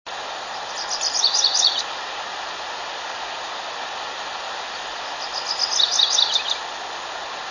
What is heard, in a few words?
Nashville Warbler